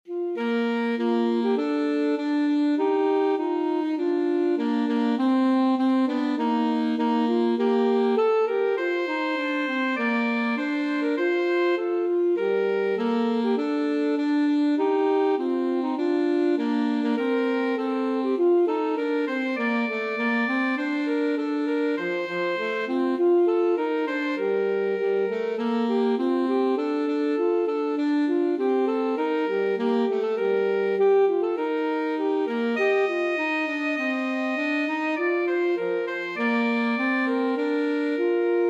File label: national anthem russia alto saxophone